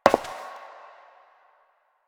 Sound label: Step Metal; Warframe; Koumei